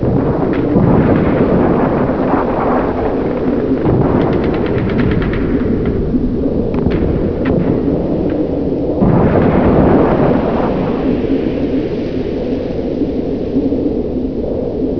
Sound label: town ambience; overlord